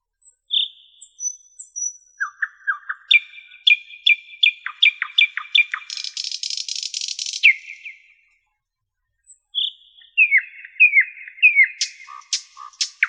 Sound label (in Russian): obyknovennyy solovey golos